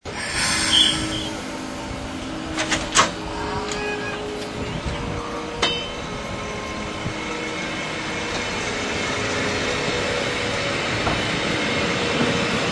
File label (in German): ABt Soundmuster; RhB; Steuerwagen